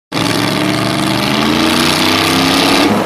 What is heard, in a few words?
1967 Dodge Dart; meloboom